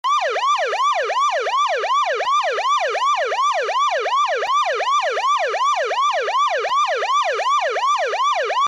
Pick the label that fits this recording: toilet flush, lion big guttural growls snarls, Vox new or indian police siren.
indian police siren